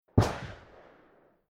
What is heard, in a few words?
Weapons Firing Range Gun Large Rifle Single Shot Black Powder Distant Blast